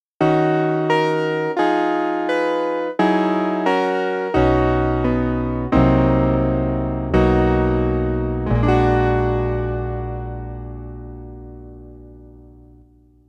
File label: Elec Piano